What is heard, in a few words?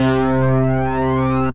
Sync Star, Synth Lead; Ableton; Factory Packs; Synth Essentials